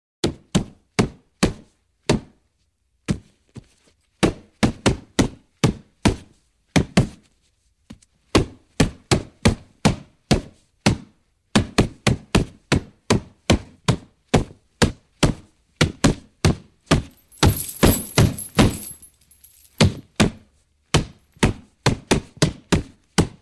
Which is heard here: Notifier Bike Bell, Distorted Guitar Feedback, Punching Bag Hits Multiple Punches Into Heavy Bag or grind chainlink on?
Punching Bag Hits Multiple Punches Into Heavy Bag